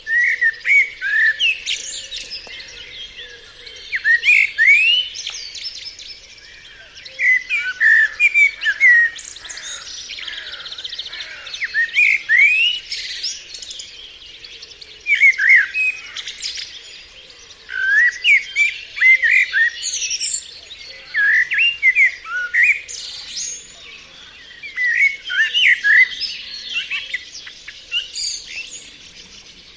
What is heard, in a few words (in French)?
merle noir; chant